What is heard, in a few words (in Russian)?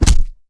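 удар кулаком соперника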